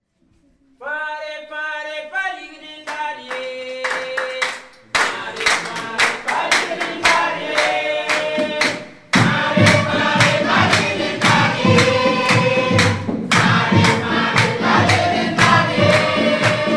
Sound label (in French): chant djembe battements de mains a ouagadougou; ouest-ouaga; sur le terrain